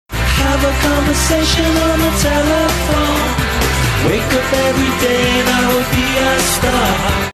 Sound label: singing along